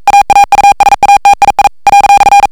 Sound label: Basic beacon; aausatii